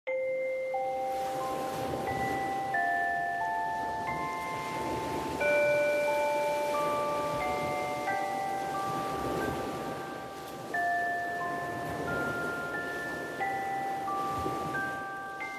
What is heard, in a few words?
Baby Sleep White Noise